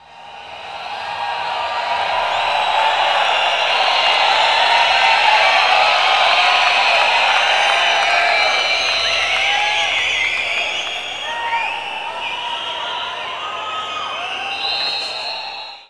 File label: APPL, XP APPLAUSE